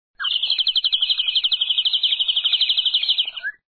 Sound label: birds sing